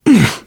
shoved

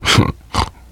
laughter, survivor voice biker

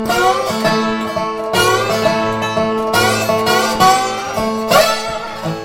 dobro

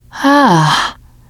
pain relief sigh, teengirl; player; survivor; voice

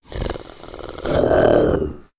hunter stalk, voice idle; Left 4 Dead 2

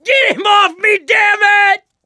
scream while pounced, mechanic, survivor; voice

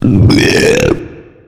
male warning; boomer

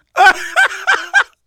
laughter, survivor voice; manager; Left 4 Dead 2; left4dead2 dlc3

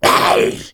hunter stunned; pain

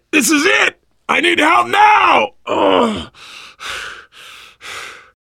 dying, survivor voice, biker

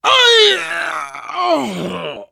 hurt critical

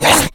been shot, infected; npc, action; Left 4 Dead 2

